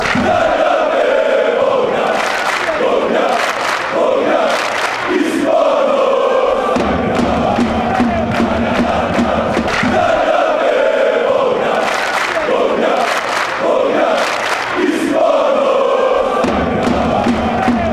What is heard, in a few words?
dinamo zagreb chant